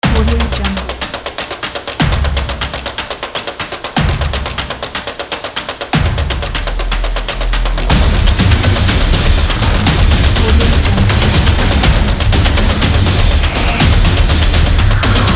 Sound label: drive